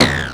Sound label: Percussive, Drums Perc One Shots